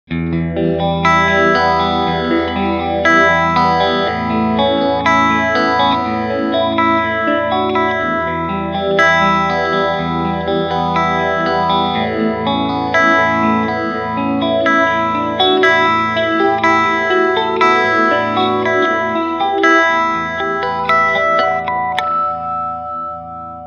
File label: Clean Univibe